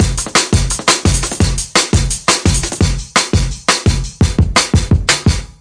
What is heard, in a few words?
171 break; drum loops and breaks; 161 to 180 bpm; skynet methlab